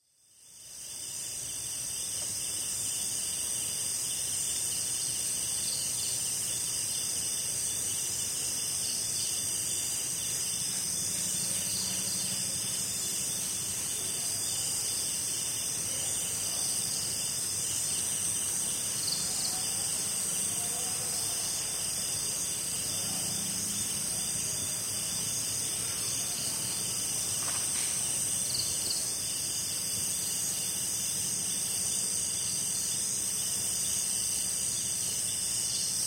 Atmospheres Forests; BBC

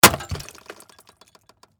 Impact Automobile Taillight, Smash, Shatter; Crash Sound Effects; Crashes; BlastWave

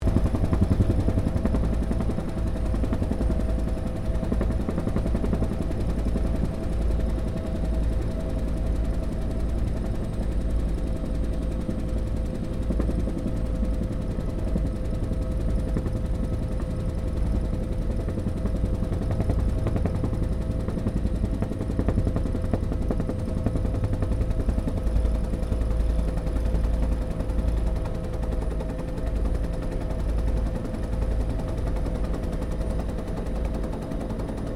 HELICOPTER 1967 BELL UH1 IROQUIOS LAND INTERIOR; RAN UH-1 Iroguios; Helicopters (Sound Effects:Vehicles)

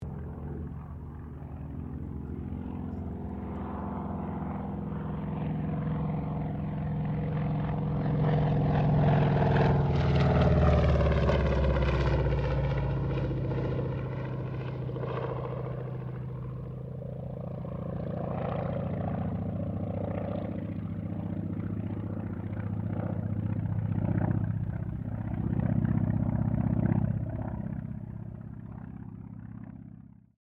AIRCRAFT CA-28 CERES PASS; Airplanes; Vehicles

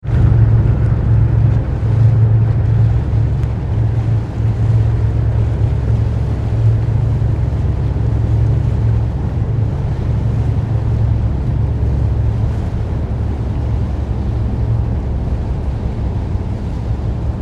Ship Car Ferry leaving Dock; Tom Hutchings

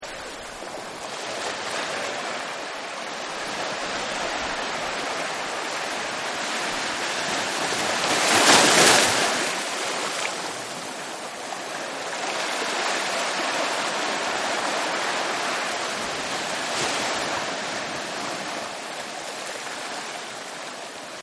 Waves on Rocks; Ocean And Sea; Nature

